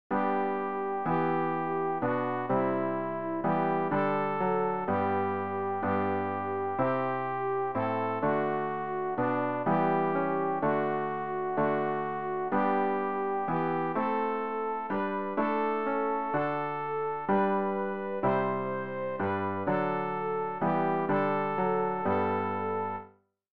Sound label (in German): sopran; Ref. Gesangbuch; RG 568: Wach auf, mein Herz